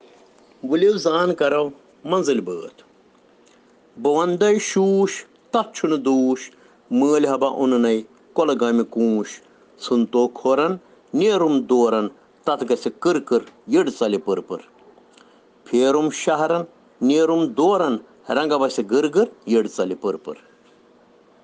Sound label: Children Rhyms in Kashmiri